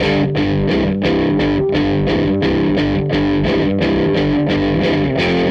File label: Tele Guitar Loop